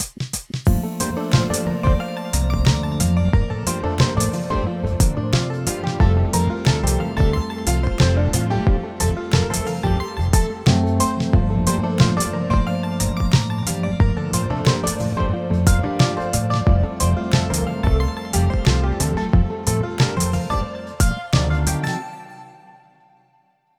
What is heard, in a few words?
Island Breeze, ringtones; Samsung Galaxy-S26-Ultra